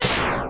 explosion; mm7sfx

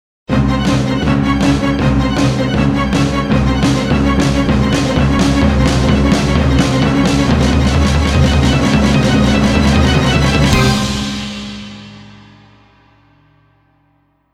Jingle Timer

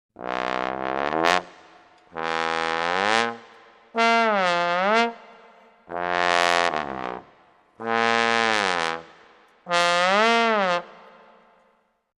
Trombone Slides; Production Music; Ren & Stimpy

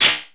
ROCK

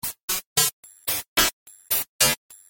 Jingle; Sega Channel Demo Cartridge #4; Genesis

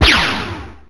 midshot; MMX4SFX

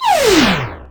teleport in; mm7sfx

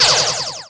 ROCK

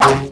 ROCK

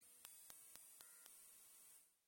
Game Over; Insector X; Genesis

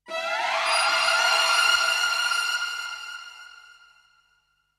Drama Shock; Ren & Stimpy

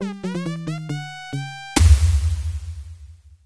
Game Over; Rocket Knight Adventures; Genesis

